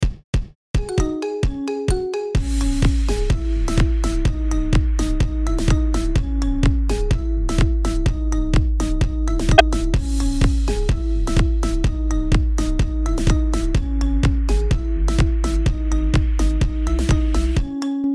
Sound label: Marimba; iPhone 11 Pro Max